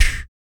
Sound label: VOC PERC, Vocal Planet Jazz FX BASS PERC